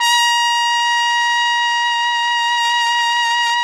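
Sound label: trumpet; Roland - LA Composer vol. 3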